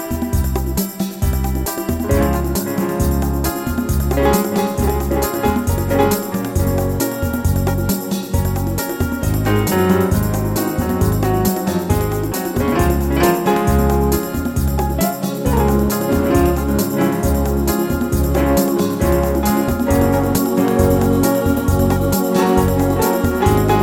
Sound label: Hot and Smooth